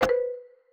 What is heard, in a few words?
hit